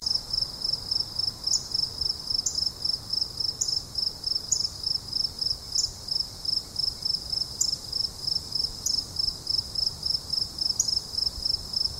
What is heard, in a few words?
Spring Field Cricket Gryllus veletis; Carl Strang